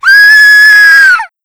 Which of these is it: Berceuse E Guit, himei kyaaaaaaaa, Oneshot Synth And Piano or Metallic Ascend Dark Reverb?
himei kyaaaaaaaa